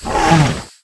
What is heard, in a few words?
mech buffalo damage, monster